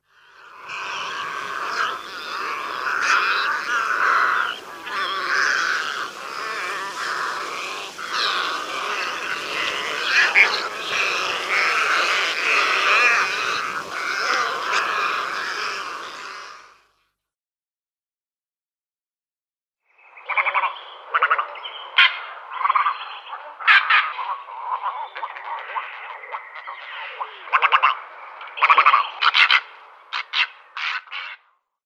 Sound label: Little Egret calls